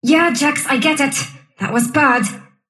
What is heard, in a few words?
sylph death; dota; dark willow